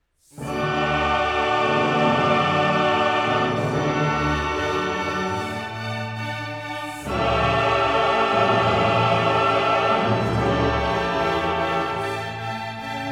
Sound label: Requiem Mass in D minor, Sanctus; Mozart; K． 626; Sancuts．